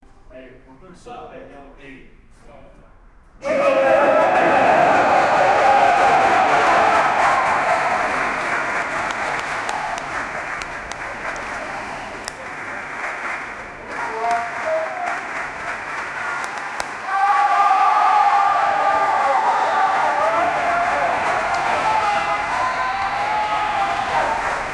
live awards ceremony

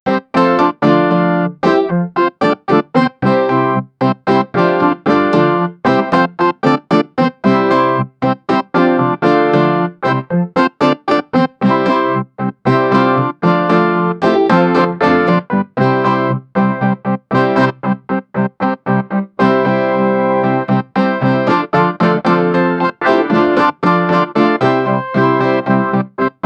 easter dance

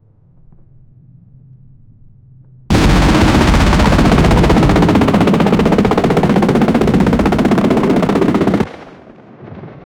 roaring speedboa; watercraft

